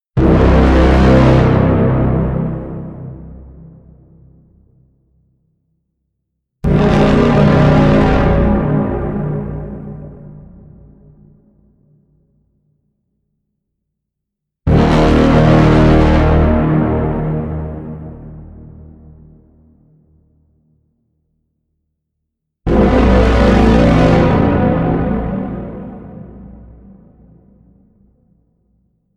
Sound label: braams; blood eagle